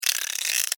Insect Voice Wet, Insect, Voice, Wet, Clicks, Vocalization, Bug; Blastwave; Animals:Insects